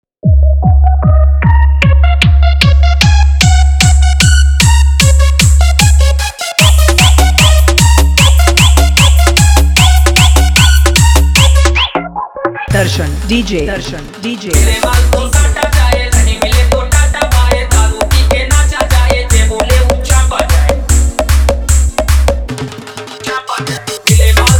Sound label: Edm Drop Humming Bass